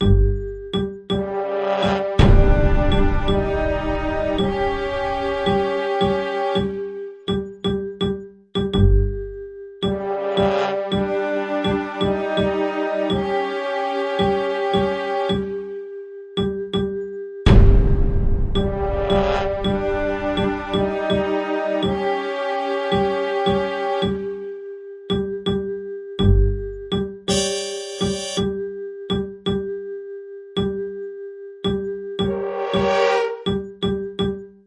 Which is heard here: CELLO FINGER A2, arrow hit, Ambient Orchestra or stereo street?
Ambient Orchestra